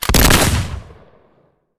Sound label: atk shotgun double